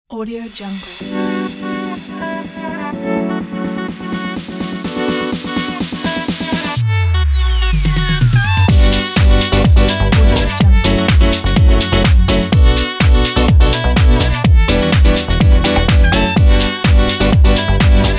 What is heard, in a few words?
summer vibe